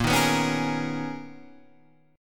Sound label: B Major 13th Bb, guitar